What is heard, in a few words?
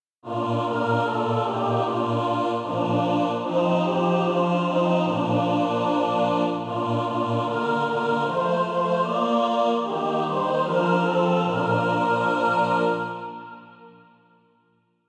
mixed